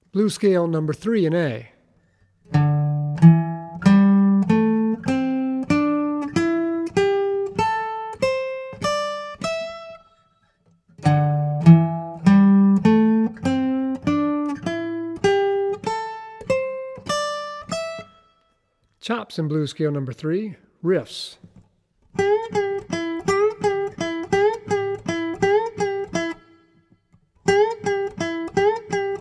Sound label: Blues Scale in A; Blues Scale #3 and Chops in A